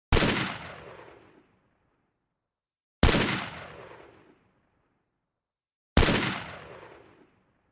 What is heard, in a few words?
Arisaka, تفنگ; تفنگ آریساکا